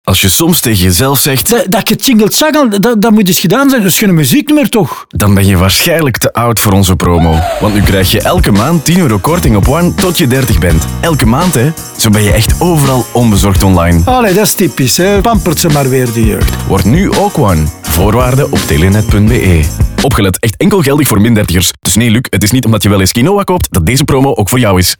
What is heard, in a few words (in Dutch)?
muziek